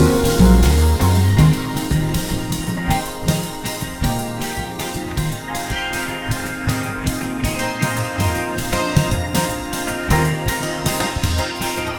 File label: loop Funk Groove Guitar in A minor bpm 90